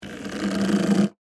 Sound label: Metal Handle Squeak, Foley, Metal, Handle, Squeeze, Grip; Machinery Sound Effects; BlastWave